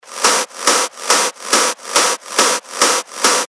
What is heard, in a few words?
140 BPM, techno loops; Azkaban; Revealed Techno Top Loops Vol. 9